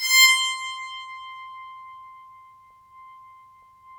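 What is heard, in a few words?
bowed psaltery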